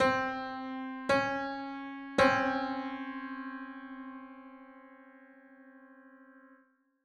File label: Piano 85 cents